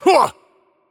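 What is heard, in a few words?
Attack Heavy, Spanish; bloom minigame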